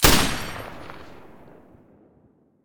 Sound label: silencer M249 stereo